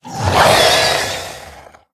Zombie Monster Die; Undead; Pain Train

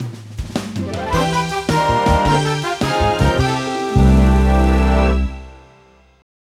Jingle Minimum Score

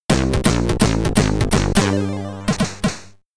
Game Over (SID Stereo); Harald Hardtooth; commodore 64

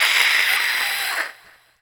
ghost witch voice hiss; Infernal Radiation

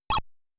Service Switch Sound

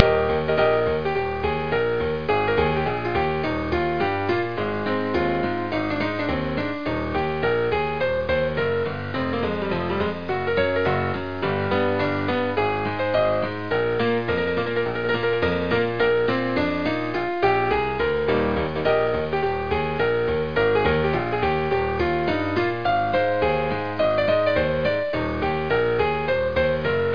Saloon Ragtime; freddi fish 4 the case of the hogfish rustlers of briny gulch

